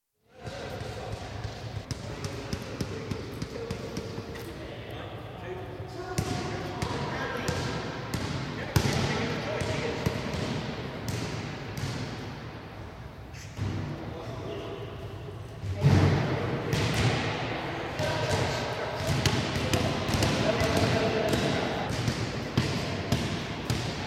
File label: Comprehensive School, basketball practice & lesson in Sports Hall, 5th Year Boys with some shouting & whistle blown occasionally (Very live acoustic); Basketball Sound Effects; BBC